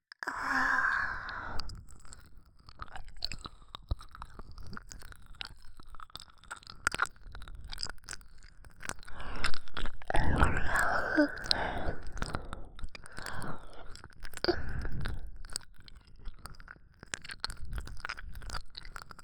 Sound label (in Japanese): 耳舐めリピートボイス左耳